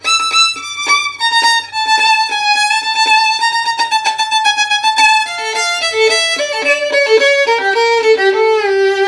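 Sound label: VIOLIN LOOP, Asian Traditions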